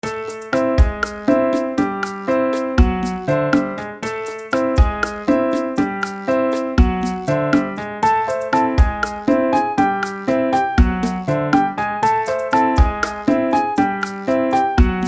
2 speeds

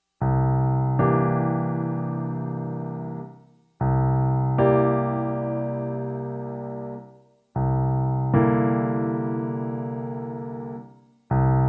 C Dominant rootless

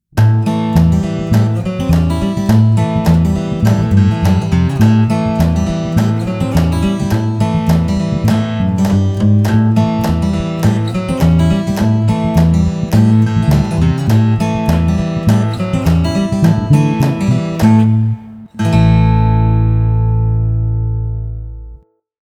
COUNTRY

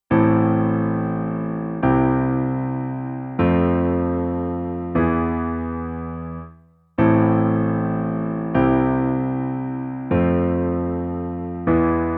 Progressions using inversions

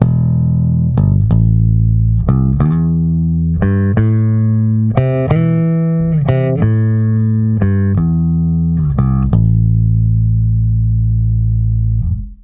TRBX treb and bass full

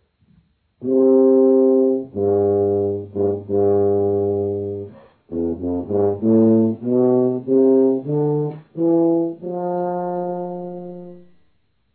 Tuba

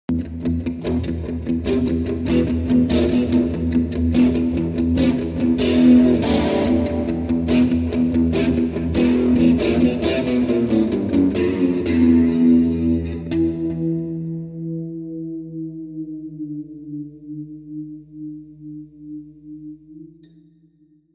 Monstrous Chords

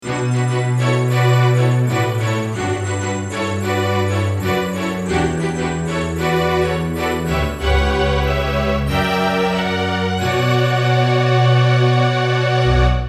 Strings n Bells

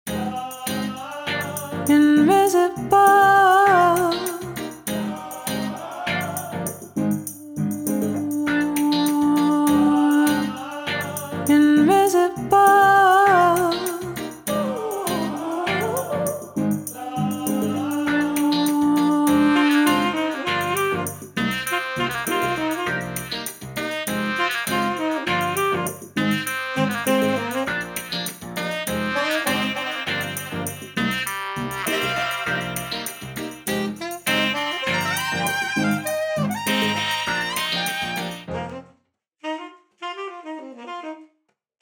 VOX NO BASS; Yamaha

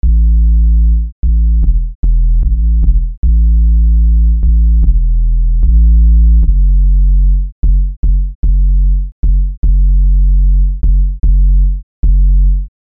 Bass

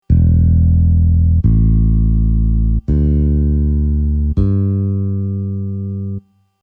Bass range

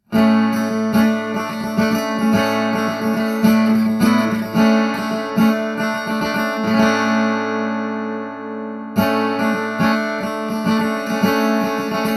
Gtr R Tube setting with Reverb

